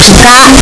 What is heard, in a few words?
pikachu cry; pokeyellow